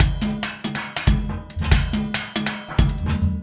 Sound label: Organic Percussion Loop - 140 BPM; Cymatics; Dubstep Starter Pack